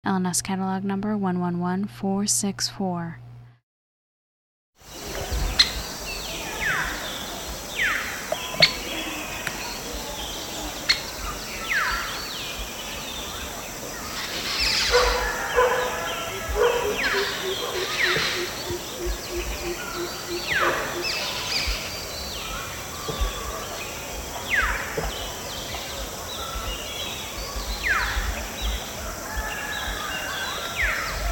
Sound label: fulvous breasted woodpecker